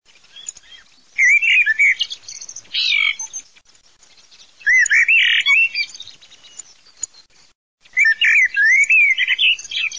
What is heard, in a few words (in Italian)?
MERLO, birdsound